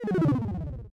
portal; Eleven: Stranger Things The Game

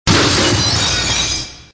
Smash Glass; Extra Lives

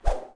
Sound Arrow Release

